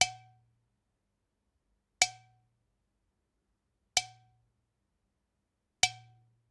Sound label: Generic Click Glass Tiny